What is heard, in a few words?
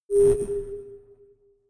notification message synth buzz; kommunikation; signale